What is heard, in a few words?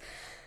female breath in; Apotheorasis